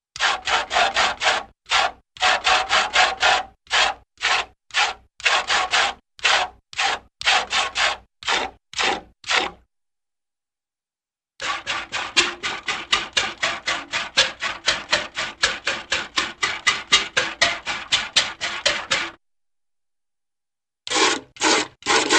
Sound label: Squirts of Water into Bucket; Hollywood Edge Cartoon Trax